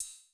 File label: Ride, Old Skool House, 132bpm; Big Fish Audio; Marshmellow; Construction Kits